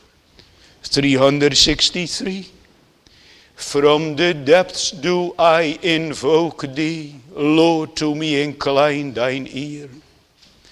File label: Singing; The Daughters of Zelophehad (1)